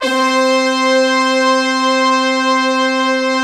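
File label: brass tutti, Brass Tutti octave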